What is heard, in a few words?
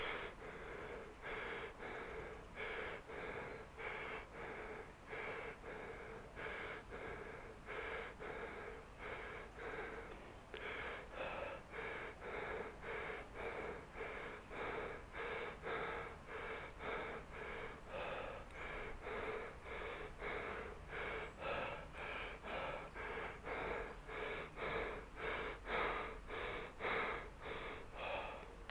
Out Of Breath Breathing Binaural